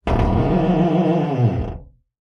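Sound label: door creak large, squeaks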